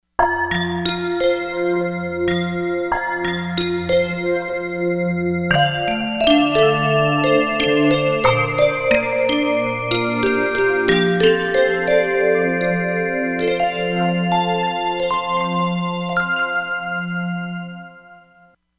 Drizzles, Korg MSC-07S Synth combination; Korg MSC-07S Synth 2

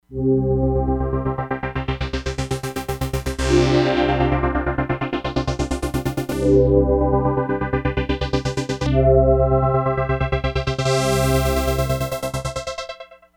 PULSE, Crumar Bit 99; Factory Programs

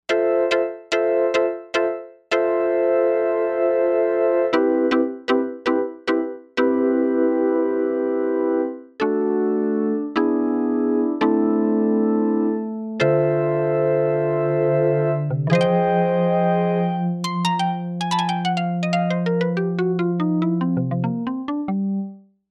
PanMod Organ, Novation UltraNova; Factory Patches

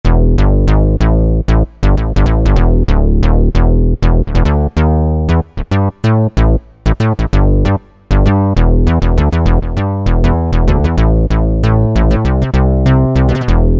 Synthbass3, Korg T3

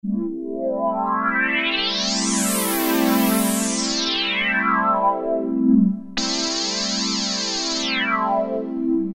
Surprise, Roland MKS-50; Factory examples

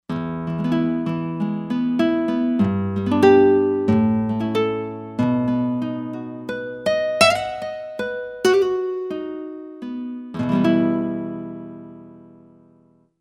Nylon Gtr, Roland Fantom XR

